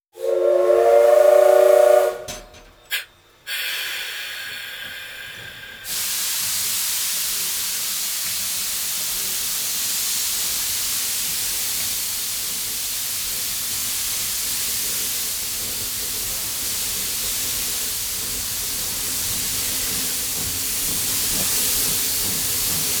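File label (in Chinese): C12 67 駕駛室內（在C12駕駛室內聽見的各種力行與惰行聲音）; 在真岡鐵道全力協助下在C12駕駛室內聽見的各種力行與惰行聲音; 萬岡→茂樹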